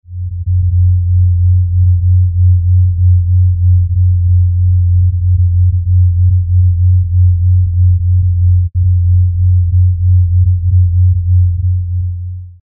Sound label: tides filtered, earth sound; solid earth tides